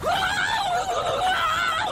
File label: screaming; bnha; deku